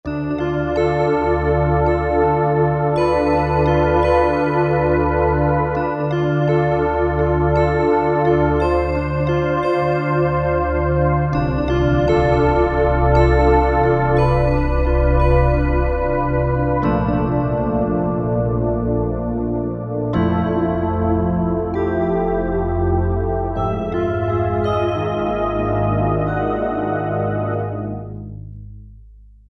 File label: Bell Choir; Optical Media International Sonic Images Library